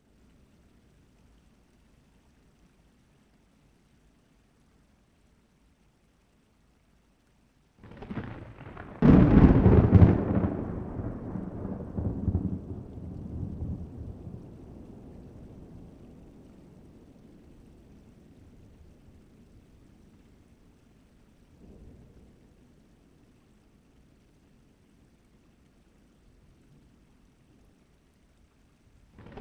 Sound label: Light rain with thunder; Sound Effects; Surround Spectacular